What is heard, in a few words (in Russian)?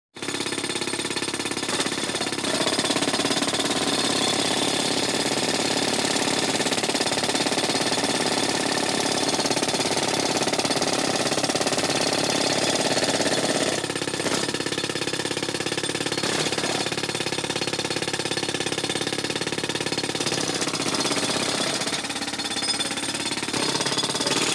pnevmaticheskaja drel